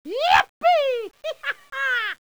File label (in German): Juppie